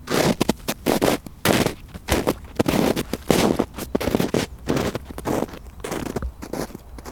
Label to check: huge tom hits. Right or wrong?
Wrong